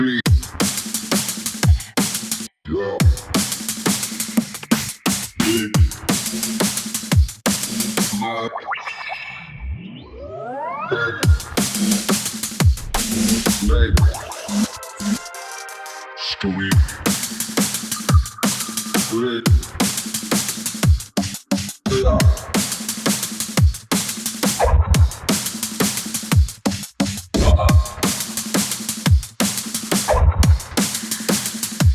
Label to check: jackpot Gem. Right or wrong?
Wrong